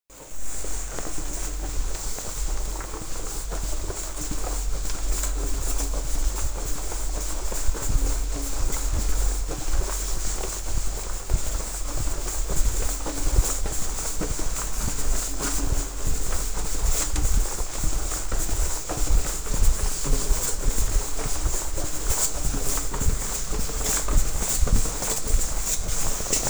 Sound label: foley